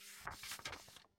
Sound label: paper